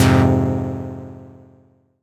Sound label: Fm Dub Chord